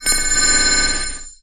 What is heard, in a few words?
Computer Alert